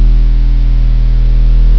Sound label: HUM LOW, MACHINES